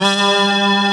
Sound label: vax synth; East West; Global Sounds